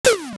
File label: shot; famiconse